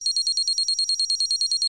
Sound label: science fiction alarm digital beep loop